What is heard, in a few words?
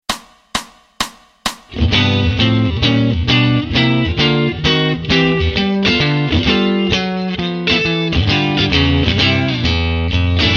WALK BASS LINES Jazz Blues